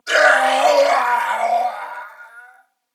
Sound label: Death Corpus Venus Male, en; Warframe; CorpusVenusMaleB